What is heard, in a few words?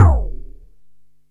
KicK, dry kicks; Quasimidi Rave-o-lution 309